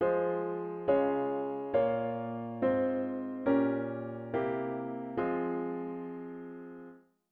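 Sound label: diatonic inverted V7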